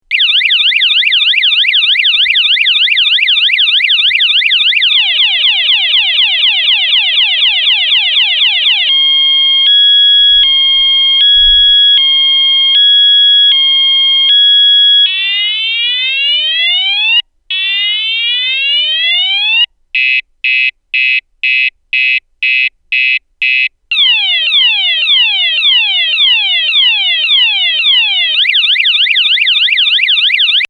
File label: siren multiple; Transducers USA